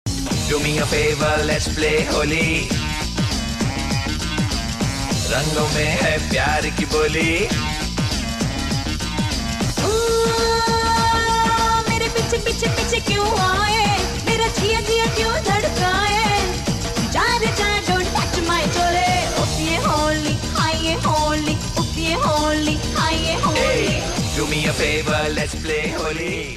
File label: Happy Holi Song Ringtone